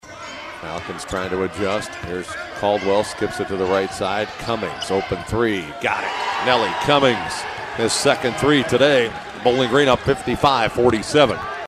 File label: 3-ball; Cummings Triple; Campbell; 2nd Half; Bowling Green State University Athletics; mens basketball; radio calls